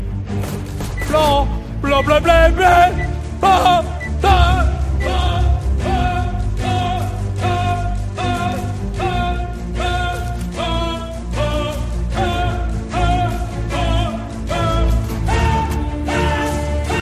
pub chant

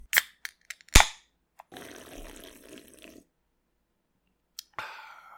opening beer

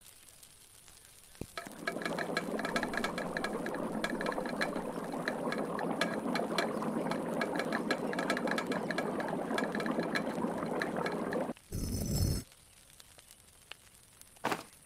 cocking, gun

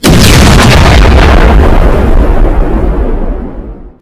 explosion very loud

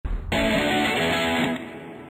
victory; mechquest

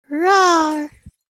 rawr

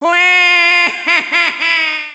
death; waluigi

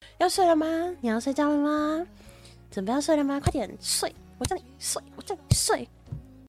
sleep

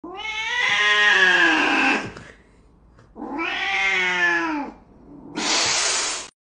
cat reaction

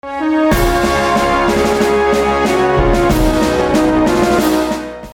fanfare; worms

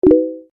pling

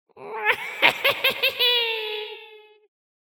laughing; archillager

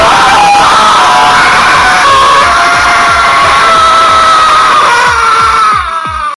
rage noooooooo

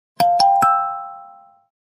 transaction successful

